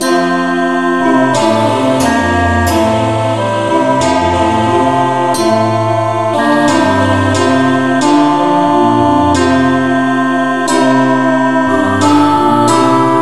no vocal, key f; christe Sanctorum

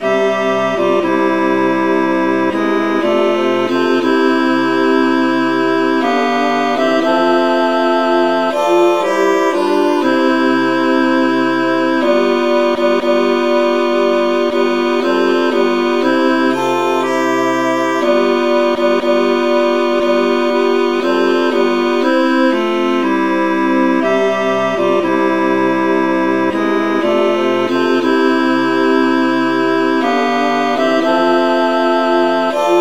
traditional key F

